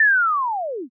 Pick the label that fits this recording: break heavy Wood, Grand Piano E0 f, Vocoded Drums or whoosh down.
whoosh down